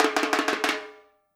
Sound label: FILL, Percussion